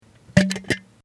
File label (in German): Schnellkochtopf